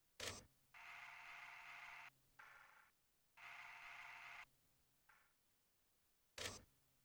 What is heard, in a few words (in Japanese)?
ヘッドホンボリューム15 フィルタ発生 音量大きい; Gemini